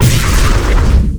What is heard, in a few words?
explosion; cow mangler; tf2classic